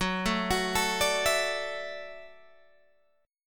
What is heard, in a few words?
Gb Minor 7th Sharp 5th, Guitar Open G